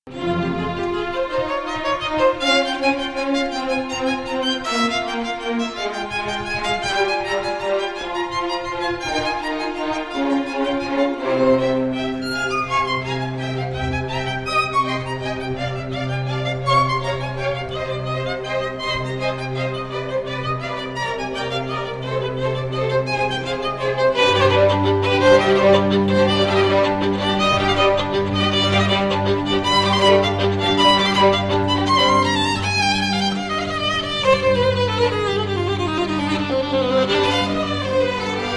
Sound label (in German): Kammerorchester